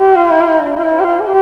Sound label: FLUTEY FLUTE; Synth FX Misc